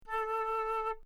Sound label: flute a4